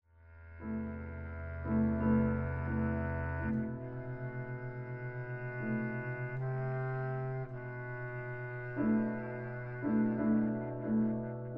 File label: E Flat Contra Alto Clarinet; Songs Without Words V. There Is a Languor